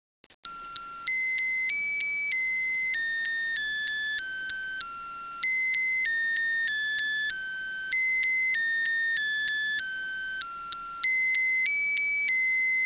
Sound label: Twinkle twinkle litter star, Melody song